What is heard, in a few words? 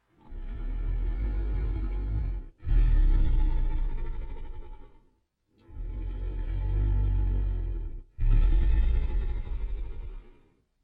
dragon sleeping